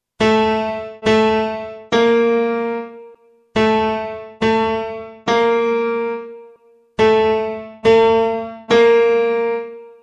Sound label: piano